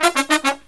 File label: Brass Riffs